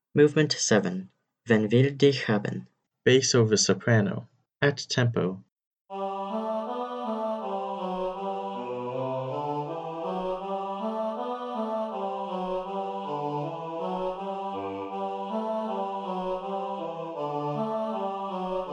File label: bass over soprano at tempo, choir